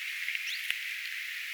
kauden toinen zuit-aaninen tiltaltti mutta onko tama aani korkeampi ja eri savyinen kuin t

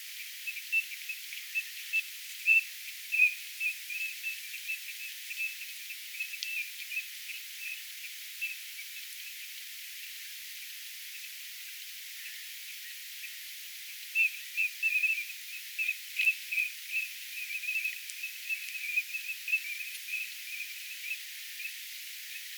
taviparven soidinaantelya

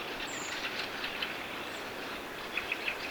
rastaslaji punakylkirastas; mika on tuo toinen; punakylkirastaslintu

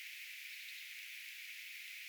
mika laji ehka rastas hiljaisesti kuuluu

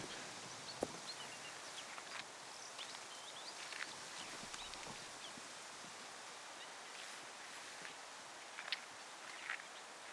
pikkulepinkäinen koiras laulaa

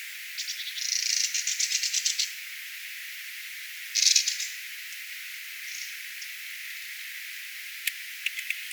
nuoren kulorastaan huomioaantelya

